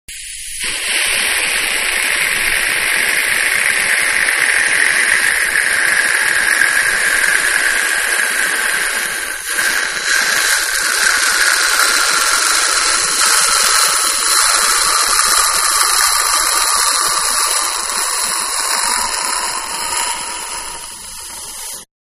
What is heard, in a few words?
Mouth Suction Device, Dentist; Machinery (Sound Effects:Machines); Manhattan Production Music